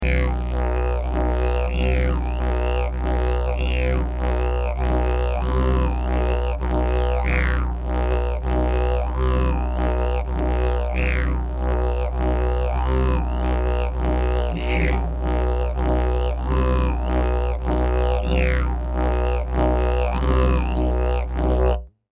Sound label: didjbone drought b